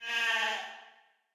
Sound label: goat; Lux Ahoy